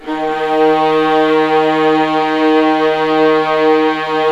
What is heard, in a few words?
D#1, Ultimate String Collection, VLAS EXP; LEG